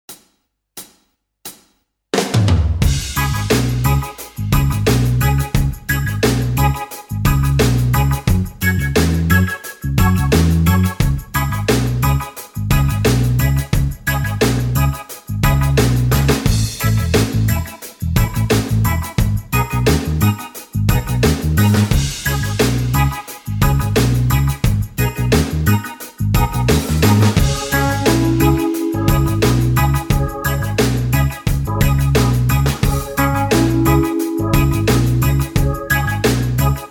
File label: reggae blues, guitar